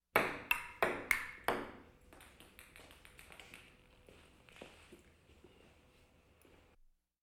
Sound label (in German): Sport Tischtennis Verpasste Angabe